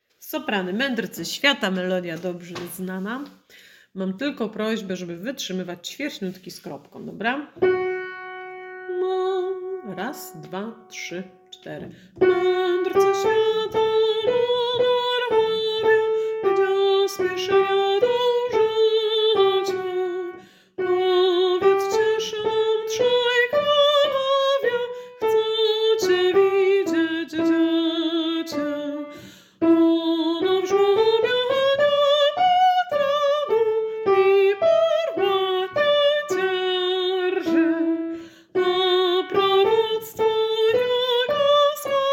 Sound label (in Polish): Medrcy swiata Sopran; Boze Narodzenie; Medrcy swiata monarchowie